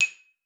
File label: D#6, xylophone; Xsample VOL-4